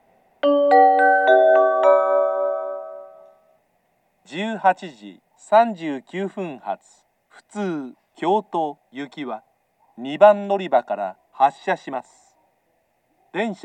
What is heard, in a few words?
local, station; settsu-motoyama; kyoto; jr-west